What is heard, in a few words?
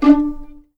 D4 PIZ MF, string; East West; The Ultimate String Collection